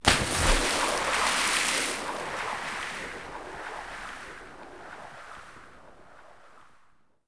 Water Enter; Quantum Redshift

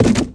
cardboard box hit; bouncyobjects; Tony Hawk's Project 8; XBOX

